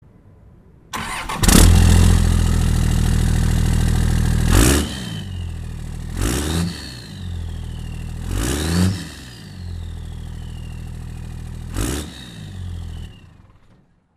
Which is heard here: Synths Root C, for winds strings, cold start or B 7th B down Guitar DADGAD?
cold start